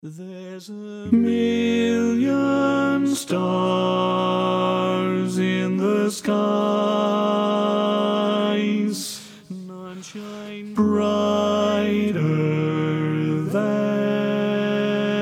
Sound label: Bari, barbershop tags